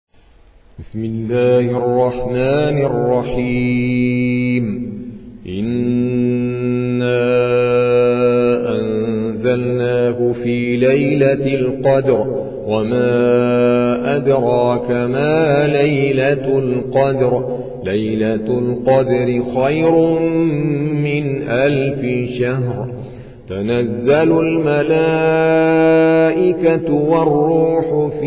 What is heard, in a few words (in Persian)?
ترتیل قاری هندی; سوره قدر